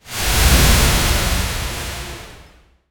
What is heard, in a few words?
environment